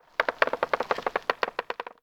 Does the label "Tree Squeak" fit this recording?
Yes